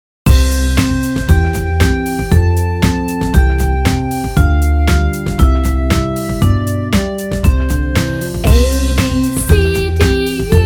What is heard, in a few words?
ABC Song